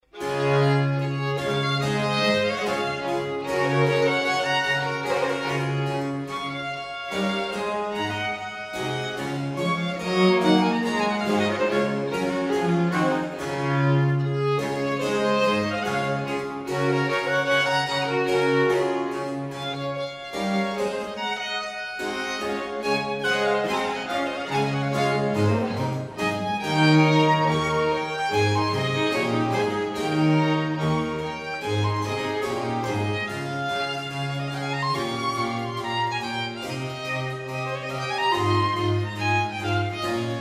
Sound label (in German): Quartett D Dur; Schwindl